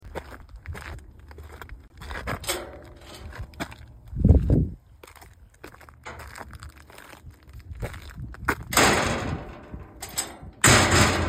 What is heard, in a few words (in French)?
entree, BRUITS DE PORTAILS; VILLIERS EN BOIS (79)